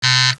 buzzer

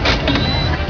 kaching; usa

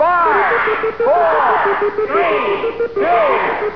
mixup

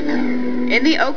stop

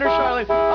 dunce

